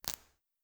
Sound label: wraith spark; Stellaris; guardians